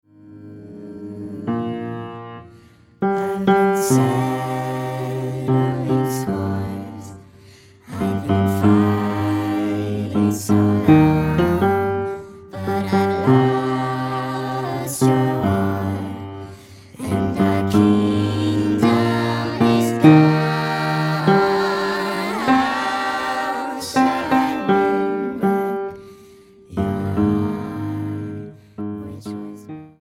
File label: Bass Call Back Cut; jazz